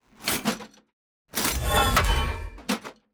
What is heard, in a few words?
hammer of justice strike